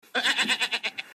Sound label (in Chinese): 羊叫（无语）; 分类：动物